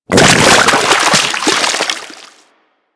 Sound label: Shipyards Sludge Burst; Ambience; Props; Warframe; Grineer Shipyards